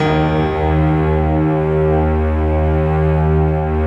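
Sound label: D#2, PIANO STRINGS; Best Service; Advanced Media Trax Modern Composer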